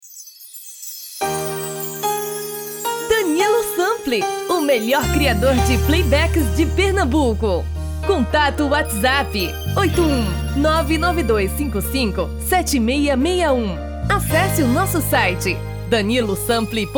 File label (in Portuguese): SWING; um tom e meio acima